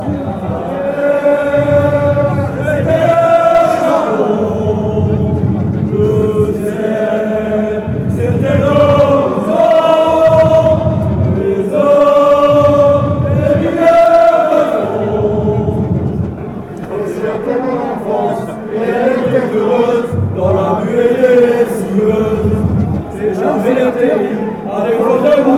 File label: lens hymne, chants